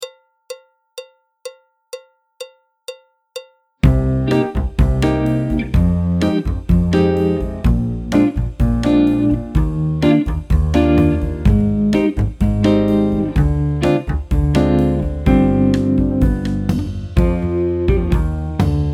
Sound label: alto, trombone, piano; Jazz Syllabus Level 4